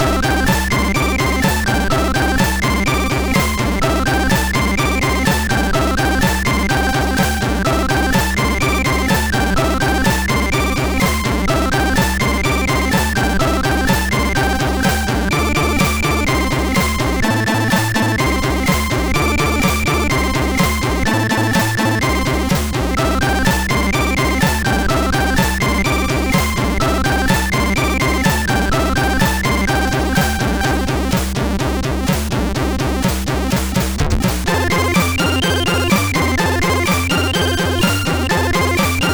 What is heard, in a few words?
Eastern Soul, sid MOS6581R4